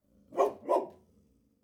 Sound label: New Barks